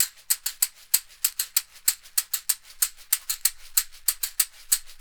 CAXIXI; ILIO; Synclavier Percussion 2; World & Orchestral

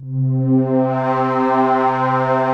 APPROACH, PADS; Masterbits; Vintage Voltage

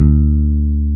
E-Basses, Roland

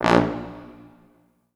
A#0 R, Orchestral Brass

